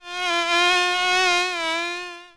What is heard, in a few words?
insect; DAoC